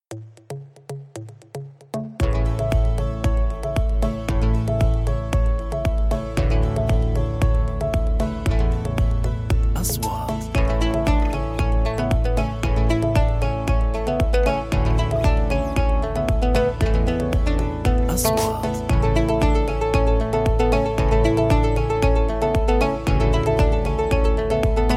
Music Corporate

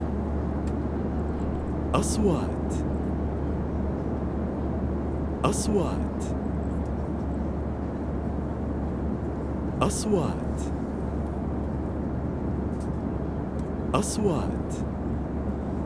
Airplane Sky Wind Sound; sound effect

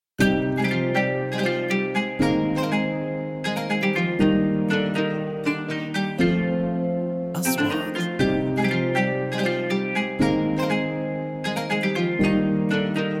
events

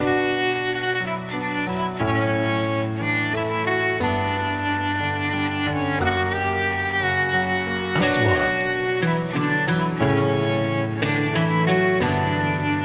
watani

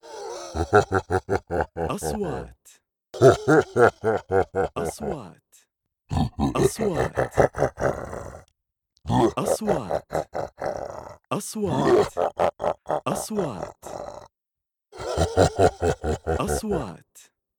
MONSTOR LAUGHING

